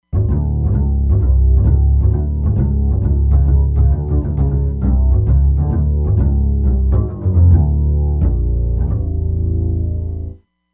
Doubled Bass

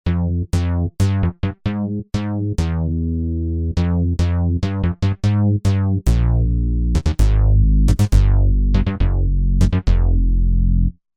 Bass Pulse Bass

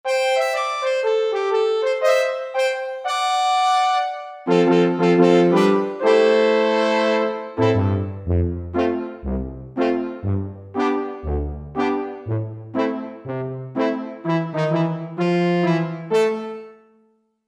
Saw-Brass; Korg MSC-1S Variety

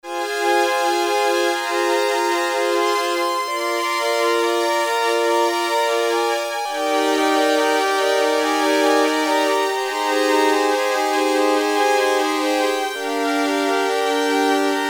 STRINGS, Oberheim OB-8; Factory Patches

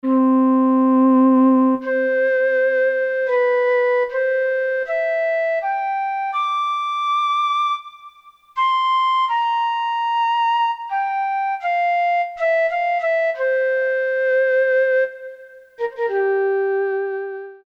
Flute

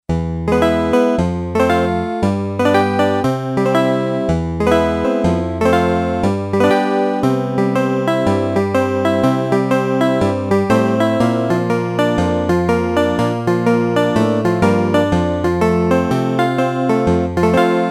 Yamaha DX11 Folk Guitar; Factory Performance Voices

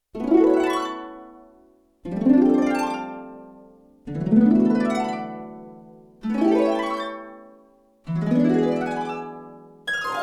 Harp Glissand, Yamaha TX16W; Harp 2

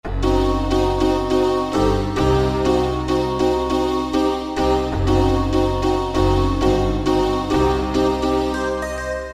Pluck, Yamaha TG33; Factory Presets; Preset bank 1

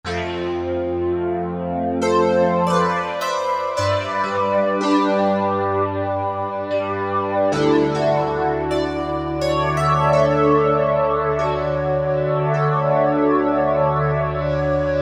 2 voices; Korg MSC-12S Synth 3

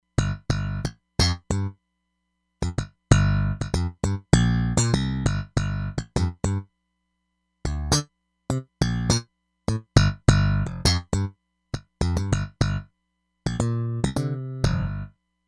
Slap Bass

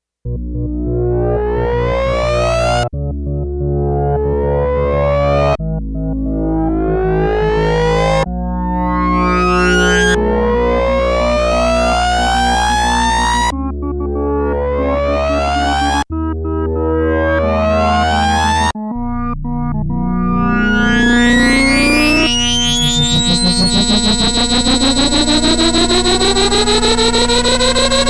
Slow Sync

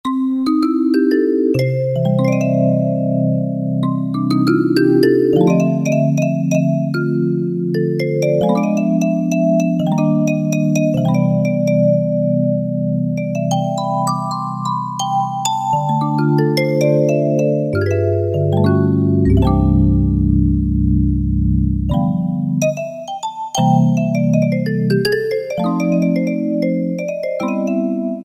Sweet Vibes, Roland PN-D50-04

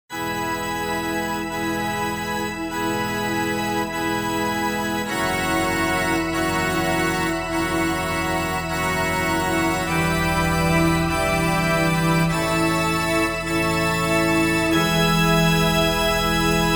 Roland JV-880, Great Church; Factory Patches

